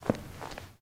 footsteps squeaky; Apotheorasis

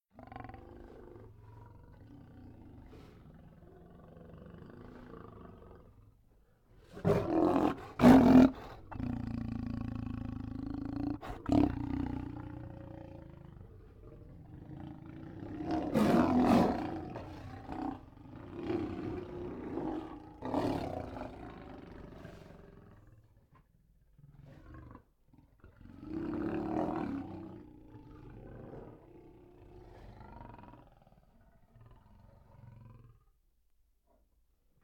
Lion roaring; KMP Film Video Sound Effects

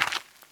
Gravel; blindside

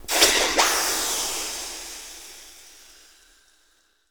Steam Sizzle Hit

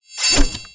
Stinking Knight Fast Attack; Blind Quest - The Frost Demon

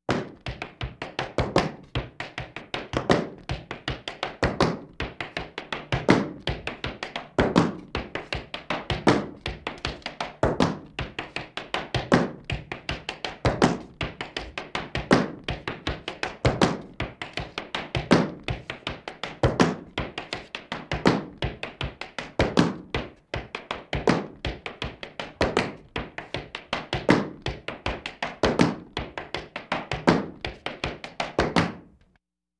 Laugh tracks

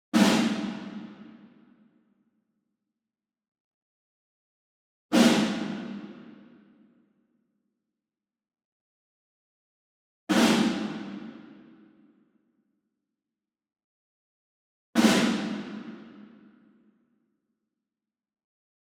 ENSEMBLE SNARE Group Flam Far; boom library; Cinematic Strikes Construction Kit

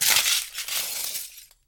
glass land; firefight

